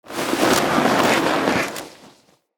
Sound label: Foley Slow; Corpus Lich; Idle Screen